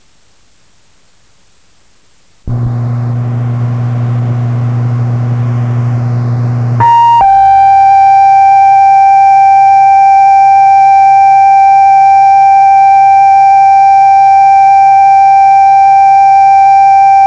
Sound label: Printer interface, cassettes